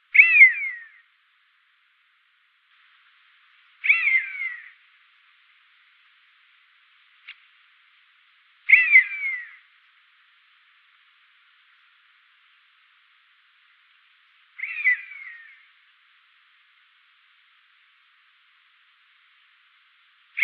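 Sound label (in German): Mäusebussard Rufe im Flug; uni-kl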